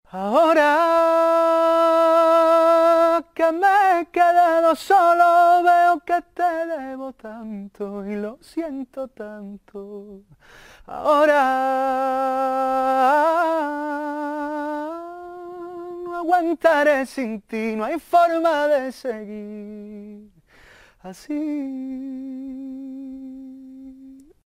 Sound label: acapella